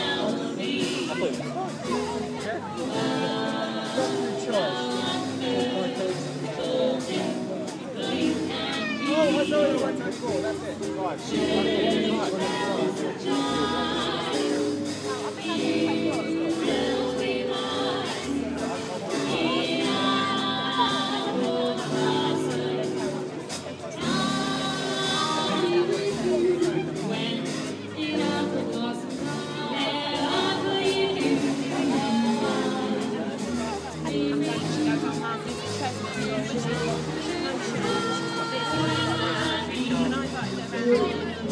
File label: street party sounds; jubilee